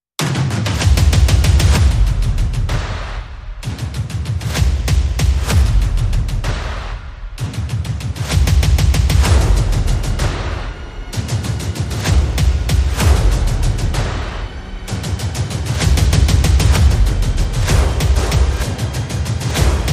Dark Perc and FX

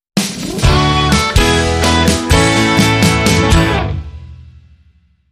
Melodic Rock

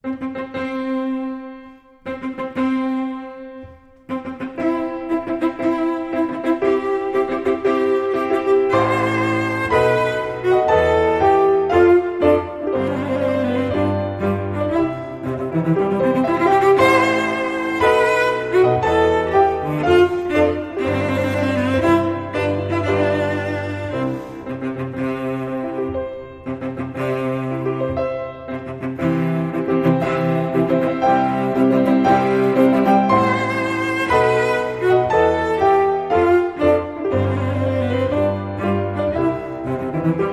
Wedding March (piano and cello)

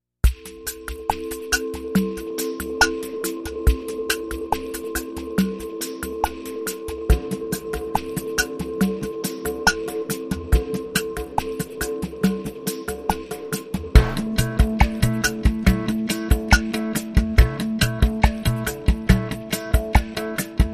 Arabian Lounge Club

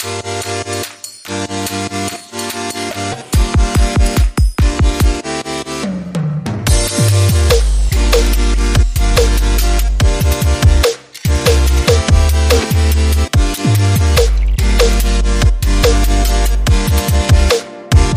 Underscore

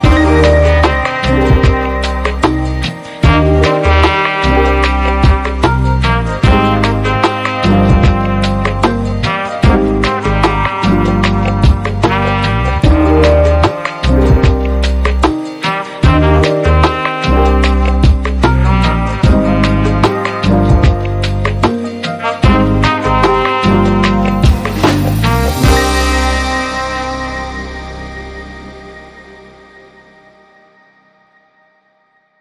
Blissed Beat

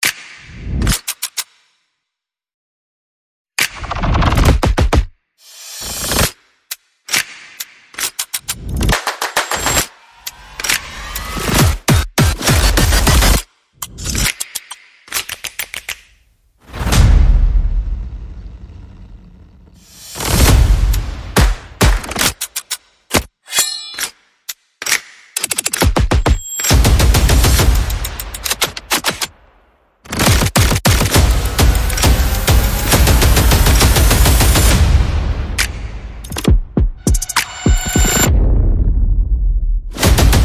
Percussion and Effects

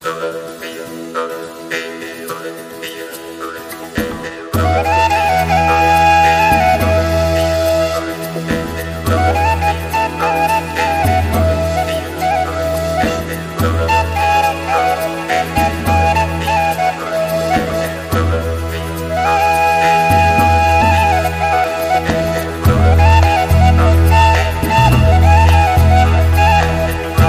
Lowland Acoustic, No Vocal SFX

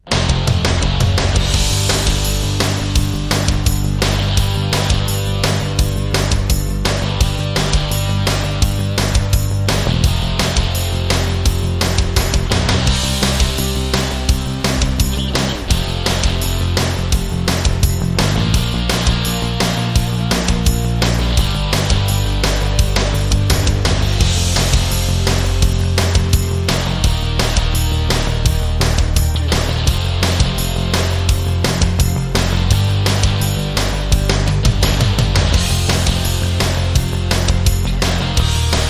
Rock

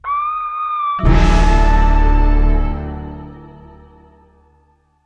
Hit

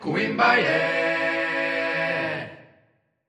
Acapella Vocal Hit

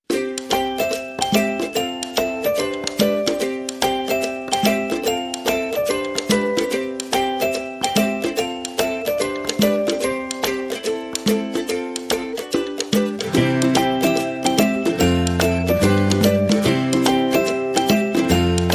No Slide Guitar

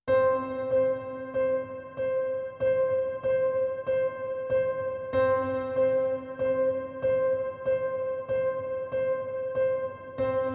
Persistent Piano C

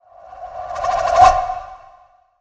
Enchanted Wobble Flyby

